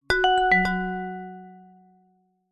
multimedia alert chime short musical notification end complete